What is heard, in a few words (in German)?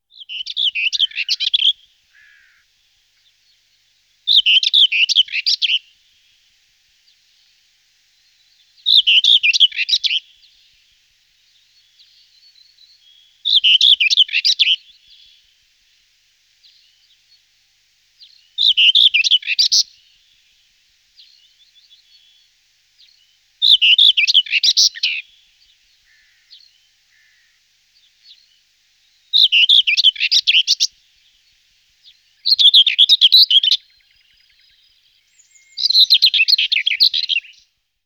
dorngrasmuecke, gesang; singvoegel; roche 2004